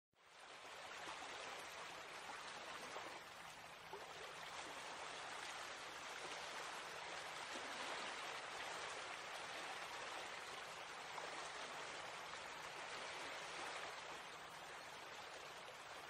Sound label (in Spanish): Arroyo agua efecto de sonido